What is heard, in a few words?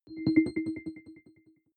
button echo chord arpeggio